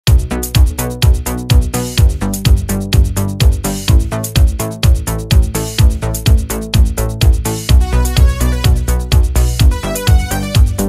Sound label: italo disco girl remix instrumental, new gen eurodisco